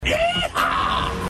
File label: Yeehaw; Robots In Disguise television show